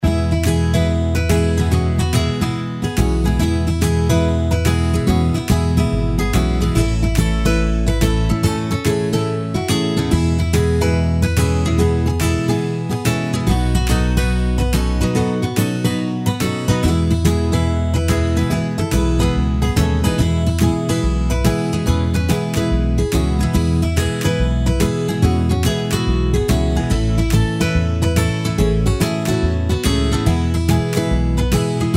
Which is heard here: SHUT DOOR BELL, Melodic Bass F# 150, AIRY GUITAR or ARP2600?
AIRY GUITAR